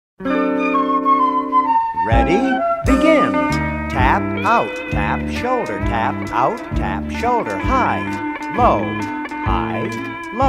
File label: hawaiian rhythm sticks; puili